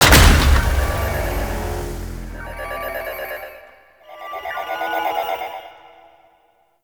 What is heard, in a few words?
Interior Orbital Station Console Deploy; Warframe; Tau